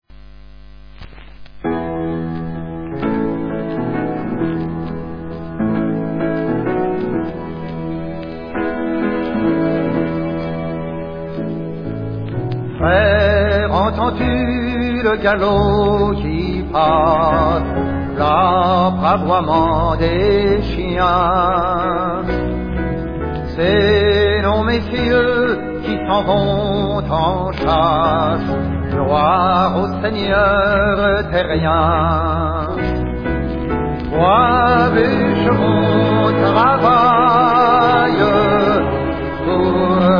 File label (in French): Le chant des ouvriers, Protest songs; Ma discotheque vinyle